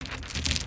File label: chain drag; creatures organic